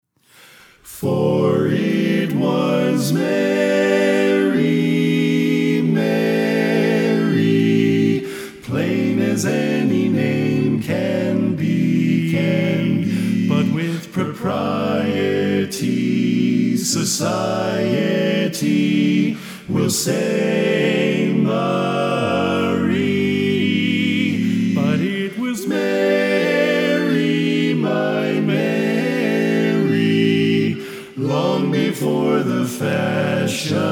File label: Lead Left